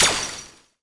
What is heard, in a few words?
Simple Gravity Switch FX Noise; Video Game; Cymatics; Video Game Sample Pack Vol 1